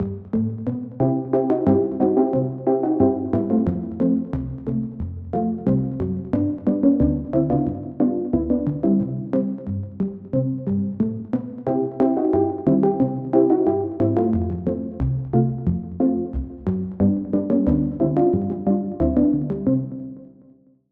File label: Key; Fairy Realm; Routed Sound; Vital Expansion - Story Walker Vol.1